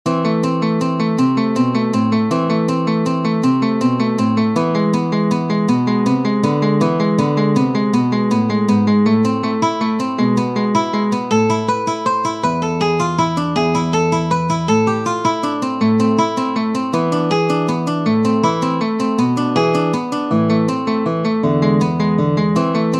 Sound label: Prelude A minor, guitar